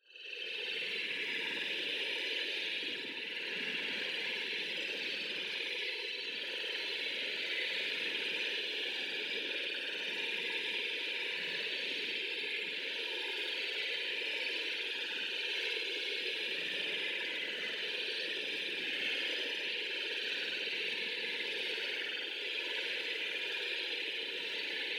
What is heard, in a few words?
AMBIENCE Distant Horde; boom library; Matter